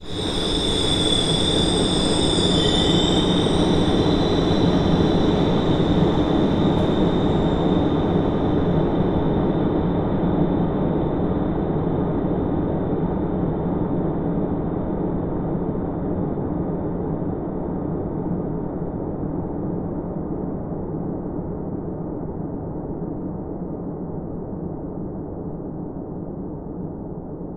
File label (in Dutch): Trein rem